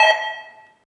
Basketball

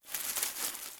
Rustle

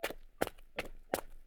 Mossman Runner Walk Loop

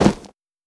Barbarian Cloth

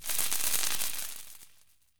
Mortar Fireworks

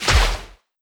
Hit effect

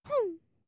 Grub Sad

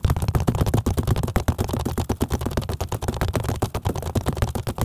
Mimic Spider Run Loop

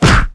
Big Punch; Knight Online

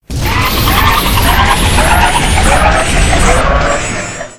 Crab Dead; unsighted; enemies

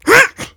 Lurker Startle

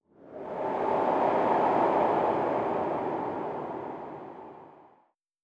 Wind Spell Sand; Legend of Keepers

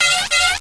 Damage

